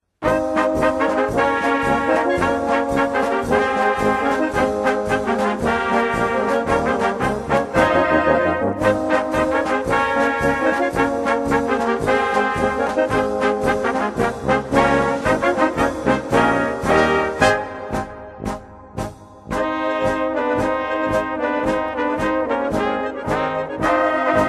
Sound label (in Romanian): Fanfara